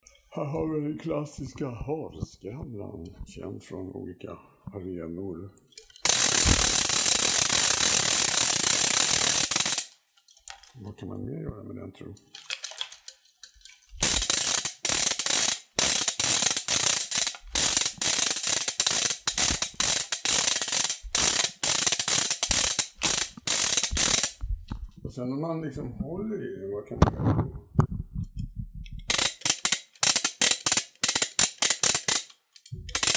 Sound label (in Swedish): harskramla